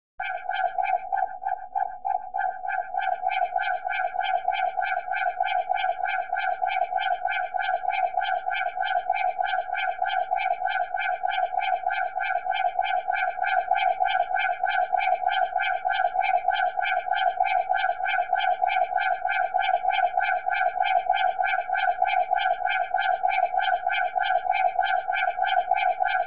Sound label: Repeating Cat Alarm, Electronically Generated Sounds; BBC Sound Effects Library